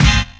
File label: STAB